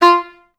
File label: STAC F3, Orchestra; Best Service; Peter Siedlaczek's Advanced Orchestra